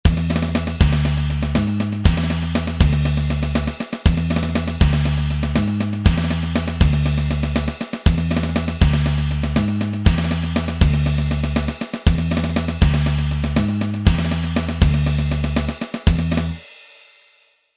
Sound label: Rock